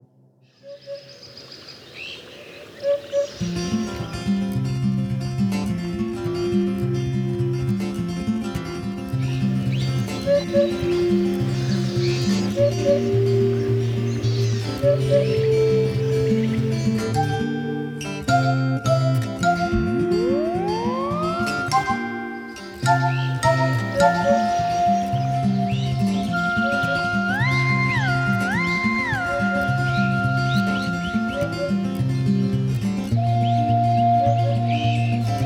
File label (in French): Radio Oiseaux la huppe fasciée